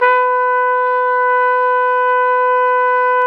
Flugelhorn; Solo Brass; Flugelhorn 2

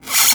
SCRATCH DRY; Q Up Arts; Denny Jaeger Private Collection

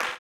CLAP, blues & soul; ILIO; Vocal Planet

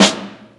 Brown Snare, Snare One-Shots; KJ Sawka; Drum n Bass & Beyond; Drum Tool Box

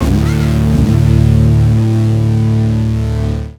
Bass FX

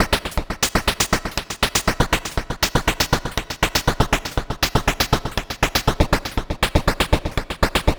Percussion, Trance NRG, Drums

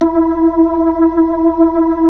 B-3

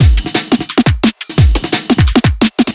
Drum Loops, 174; M8; Electronisounds

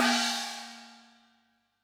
GONG, Yamaha DD-65

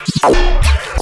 Oversampled glitch; NEBULA

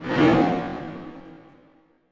STRINGACCENT, Orchestral Loops

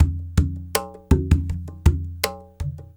RSS WAVEDR; Spectrasonics

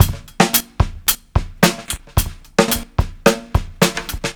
Live Beats, R&B Drums

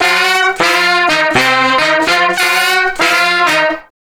Horn Sect Effect; Effected; Sonic Foundry (Sony Creative Software); Jazz Solos and Sections

